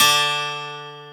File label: 12STR, PIANO; AKAI S-Series CD-ROM Sound Library VOL-8